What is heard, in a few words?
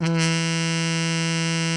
MUTED E2, TRUMPETS; Greytsounds VOL-1